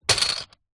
office padded envelope containing small plastic items drop wood; zapsplat